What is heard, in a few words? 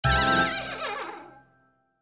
Fail